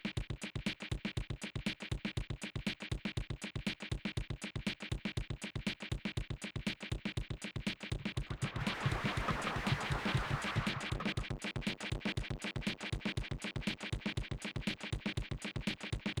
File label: beat